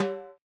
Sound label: RX8 Timbl H, Yamaha RX-8